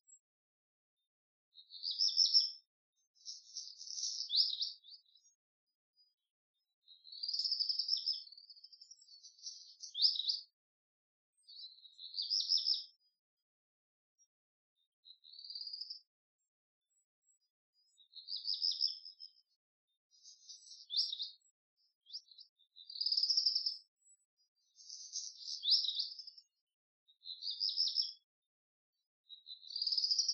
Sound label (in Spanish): ave; lugano; choir of species